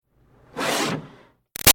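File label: Water Spray Interior Car Grill Fast; Car Wash; Spraying; Big Room Sound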